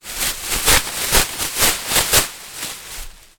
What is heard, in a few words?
foley plastic bin trash liner bag open shake; zapsplat